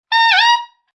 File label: Sax Yell, A saxophone yell; Funny Musical; Sound Effects:Musical